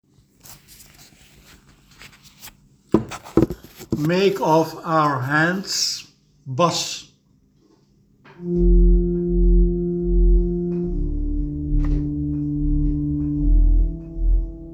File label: Make of our hands Bas; West Side Story